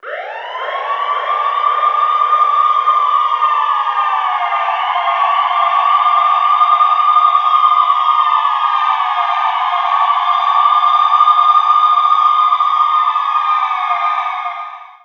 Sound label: BIG WAIL, TOWN FX; USB Soundscan vol.02; Underground Hip Hop; AKAI